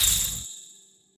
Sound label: bomb explosion pre